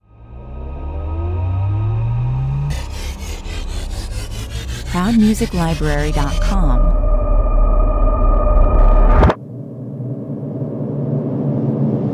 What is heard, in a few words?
boom bang bam rev suction 126 bpm